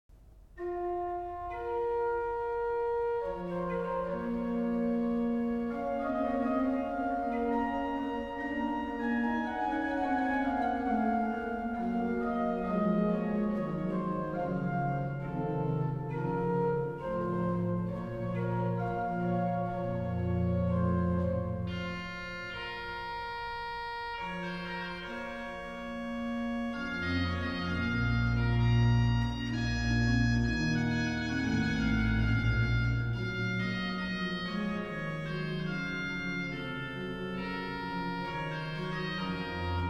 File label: hautbois flute, organ